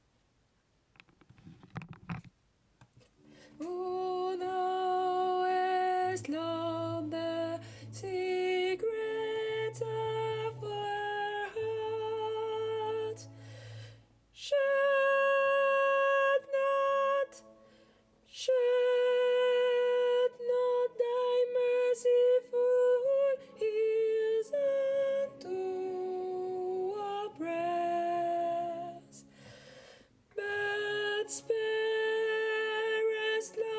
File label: soprano; Thou knowest lord